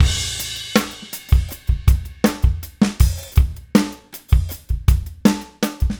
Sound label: Slow Groove Beat, Rock Drums